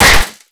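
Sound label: bullet impact paint metal; Modern Warfare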